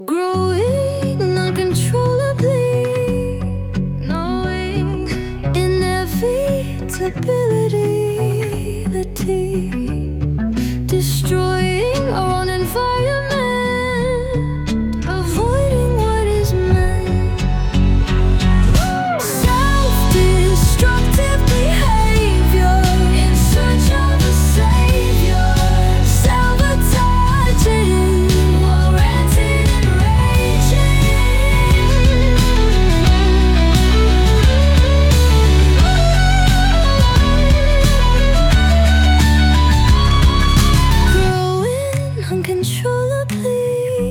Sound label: Unplugged Interlude